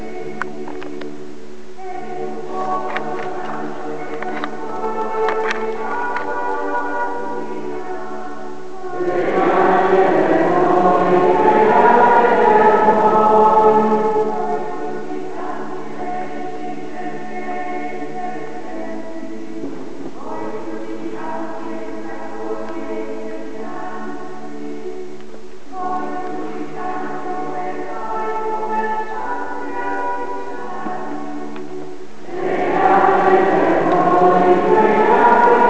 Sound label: singing